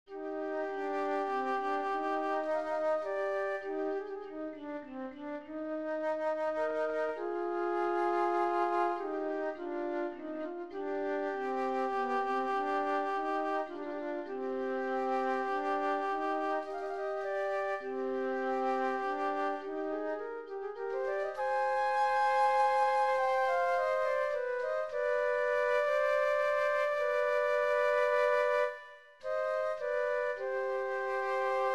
duo for flutes minor

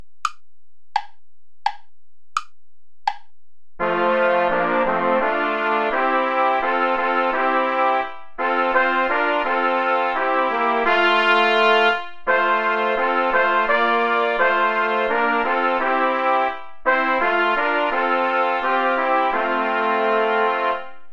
play along without trombone; ich singe dir mit herz und mund

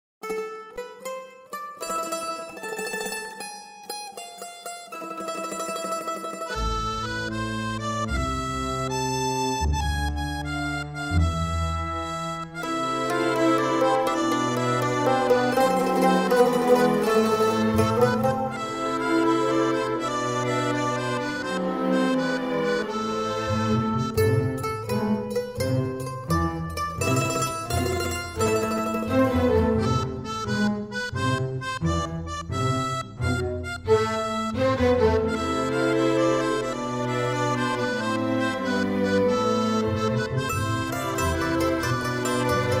tarantella orchestra

